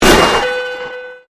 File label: Convolution Pulse